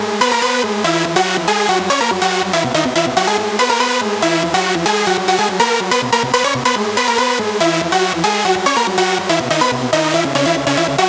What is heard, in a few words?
Special Sounds Root G# 142 BPM; vengeance essential club sounds-3